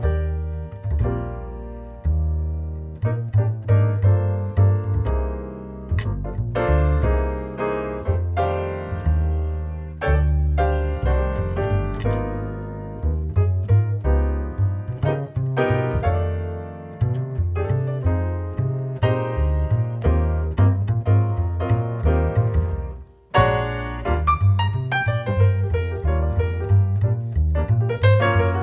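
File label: Jitterbug piano bass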